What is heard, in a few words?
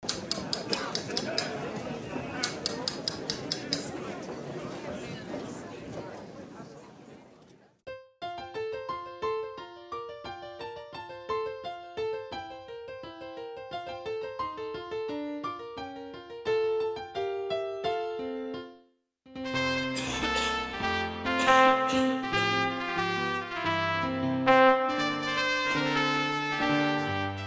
Christmas Trumpet Recital